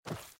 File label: land in water